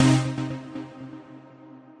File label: Synthesizer Middle C Notes, Lead